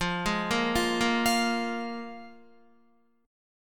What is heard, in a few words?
F Minor Double Flat 5th F Guitar; Fmbb5 arpeggiated; JGuitar